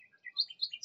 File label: Bird Pajaro